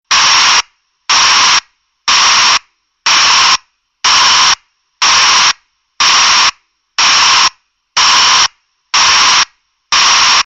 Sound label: broadband alarm; noise